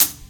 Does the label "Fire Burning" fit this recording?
No